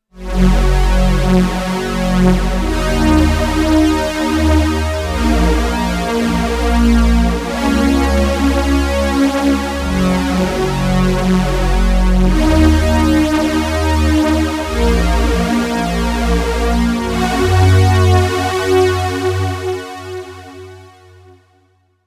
Hoover Pad; dense

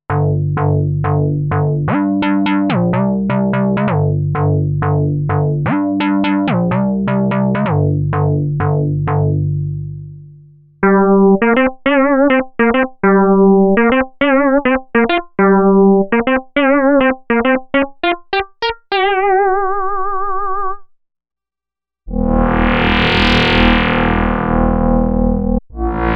Synth; Torsion

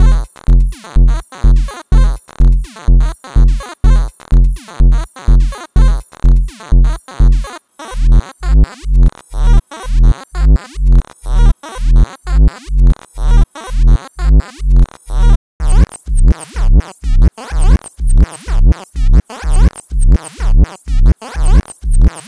Glitch Loop

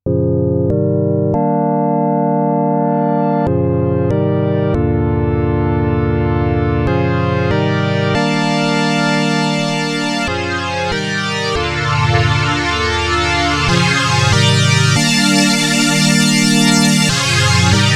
KORG Node Vox FM

